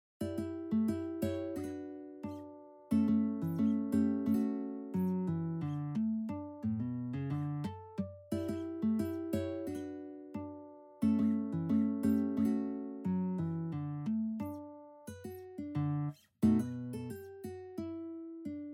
dorian dance

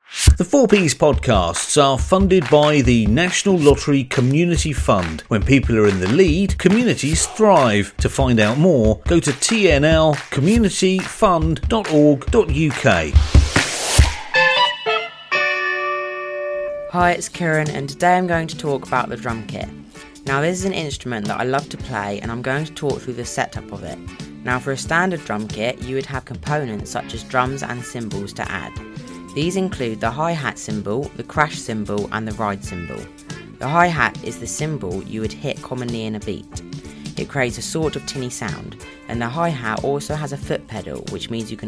drum kit; Carisbrooke College

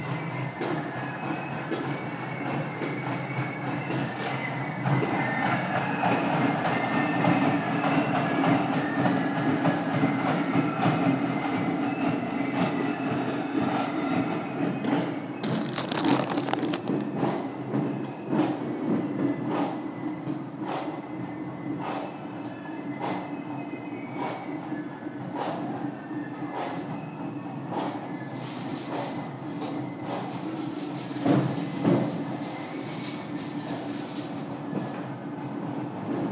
marching in glasgow

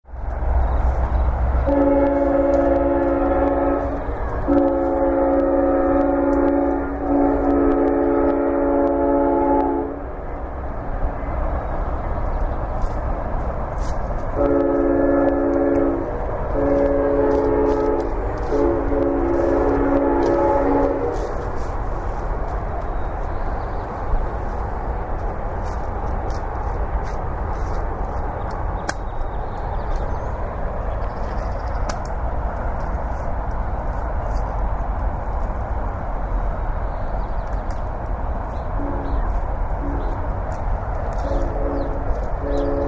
amtrak train horns

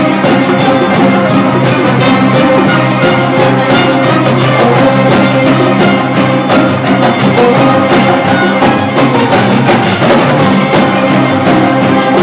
xilo orchestra

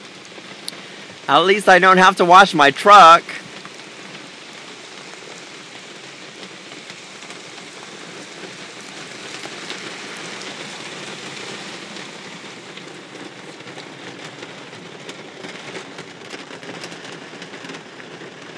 truck in heavy rain

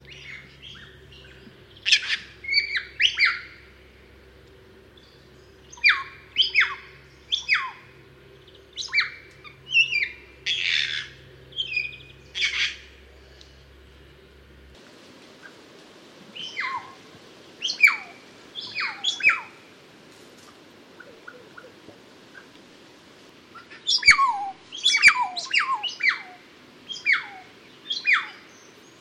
kaka whistles and skraarks

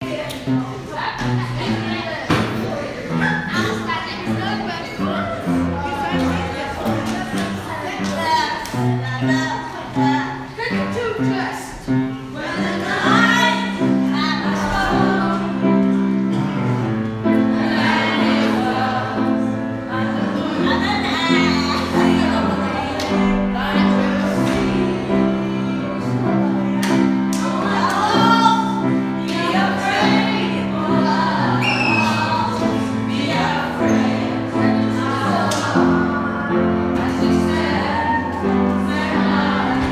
school singing assembly

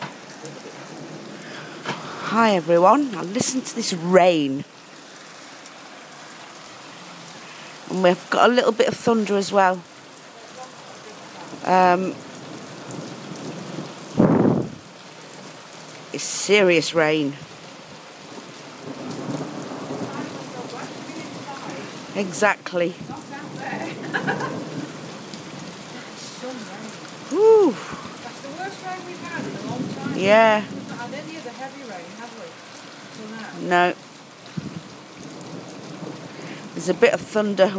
serious rain and a bit of thunder

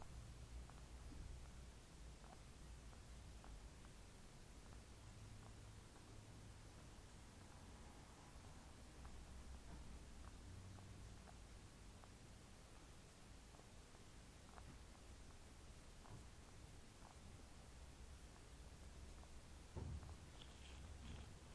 bunny rabbit tooth purrs whilst being stroked

